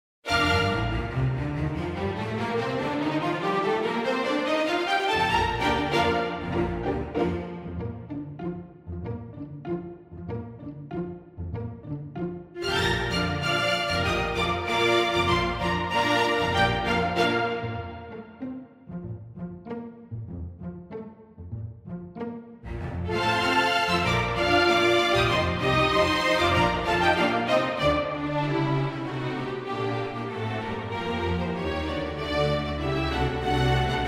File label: volume strings